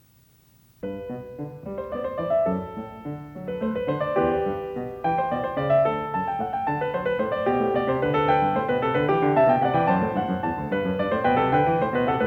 Goldberg Variations, BWV 988 Variatio 3 a 1 Clav. Canone all' Unisono; Johann Sebastian Bach